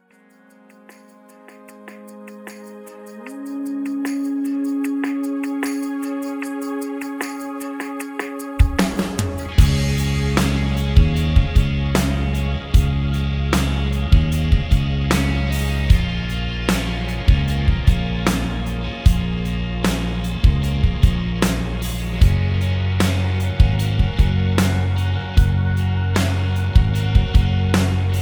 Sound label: rhythm